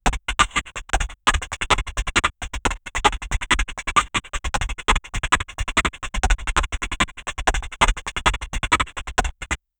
cheerful cartoon running, bouncy

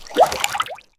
a medium object splashes

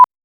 four beeps of 1000hz, sequence

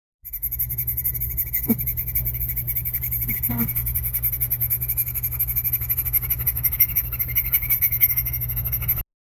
big slimy egg is hatching

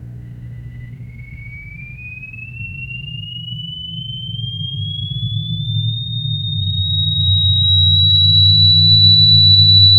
low rumble heat distortio; high pitch

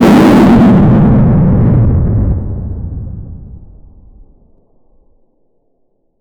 two nuclear missiles go, launch

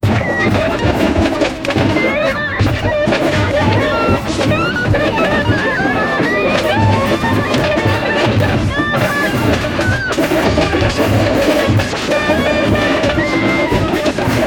song

